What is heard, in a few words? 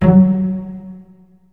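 cello pizzicato F#2, Virtuoso Strings; ILIO; Kirk Hunter Virtuoso Strings VOL-3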